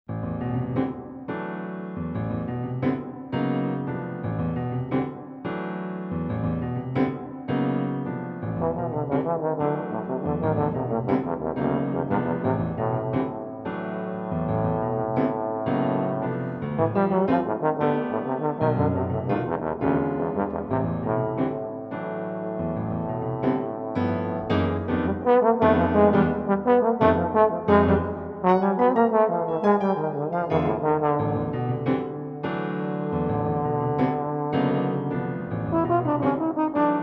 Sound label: for French horn bass trombone and piano, Blues in 3